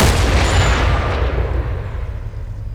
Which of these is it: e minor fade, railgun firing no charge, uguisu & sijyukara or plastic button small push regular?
railgun firing no charge